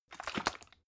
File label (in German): bottle syrup shake single, gastronomie